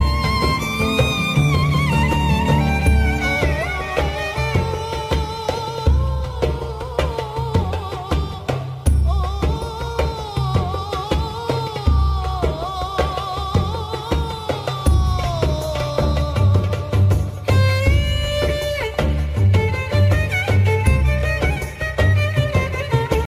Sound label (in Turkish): turk elektro